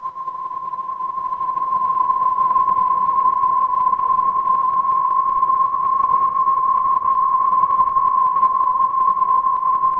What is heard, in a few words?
ambience forest